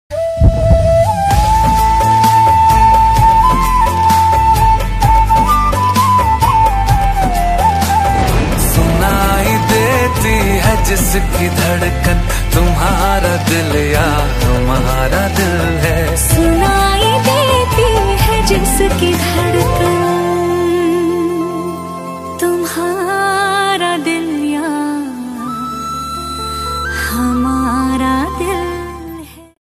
ringtone instrumental music ringtone

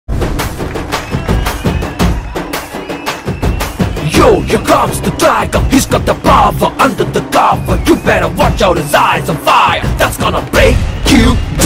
hero intro bgm; yamadonga; jr ntr; priyamani; s s rajamouli

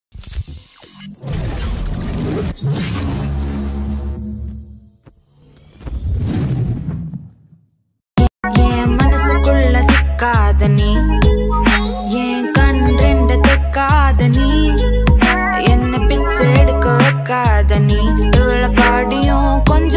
song ringtone

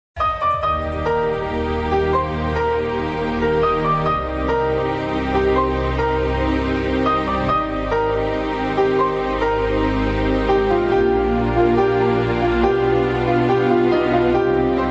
love bgm; oye

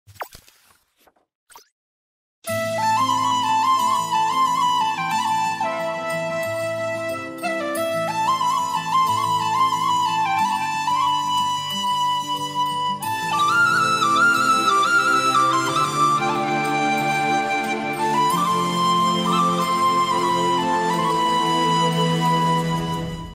flute bgm ringtone heart touching flute

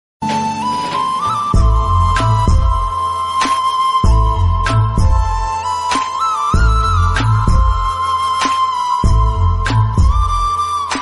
sad flute instrumental ringtone; heart break; krishna; mahabharat

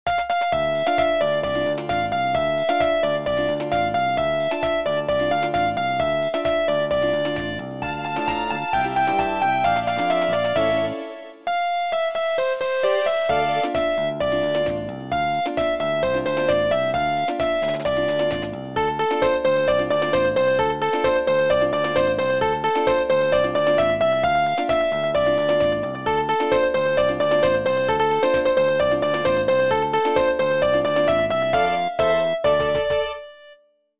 nokia ringtone

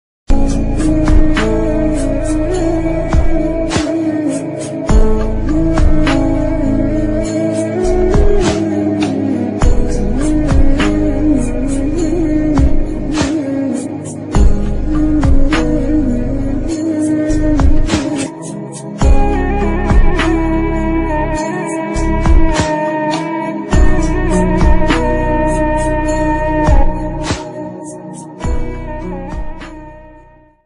arabiya party ringtone; badboys mobile ringtone

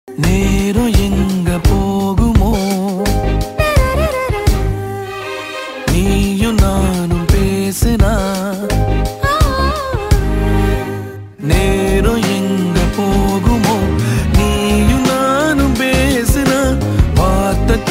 tamil love song; good night

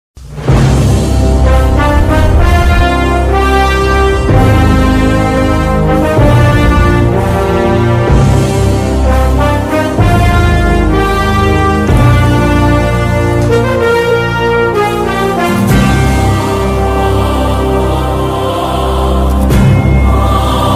bgm music ringtone; Khaleja

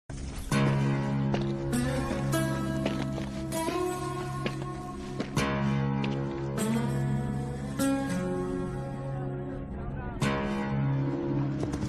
hero entry bgm; Khaleja

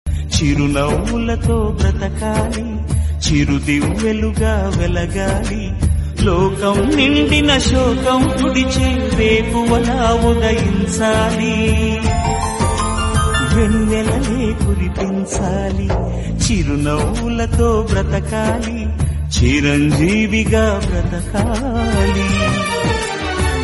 Heart Touching Emotional Telugu Song

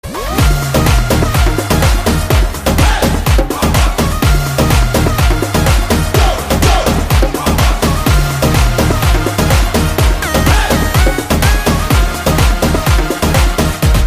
Love Bgm; Ruler

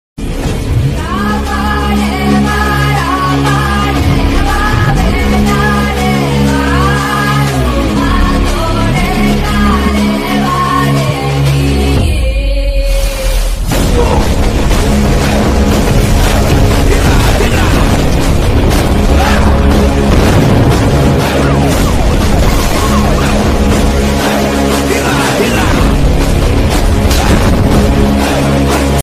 attitude ringtones; arjuna phalguna movie

